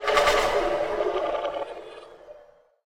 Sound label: death; Barotrauma; Barotraumatic; Legacycrawler